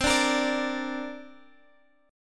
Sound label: C 7th Suspended 2nd, Guitar, Drop D